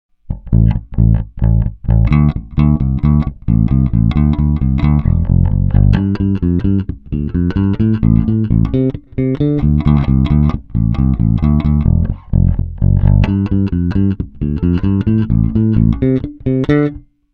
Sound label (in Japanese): TAURAMパッシブ・指弾き, ベース; 4.TAURAMパッシブ・指弾き